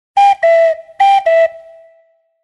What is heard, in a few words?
cuckoo clock whistle